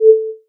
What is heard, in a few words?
amp Envelope, tone